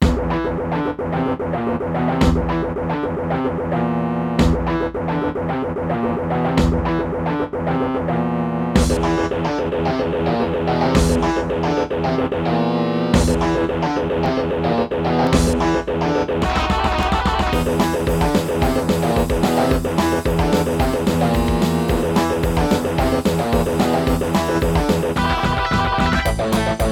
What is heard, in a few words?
aztech sg waverider32; AZTech SoundGalaxy Waverider 32 Plus; soundcard test; Doom